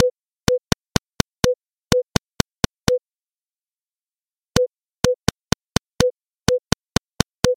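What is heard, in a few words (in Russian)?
легкое простукивание мелодии с помощью музыкального инструмента и руки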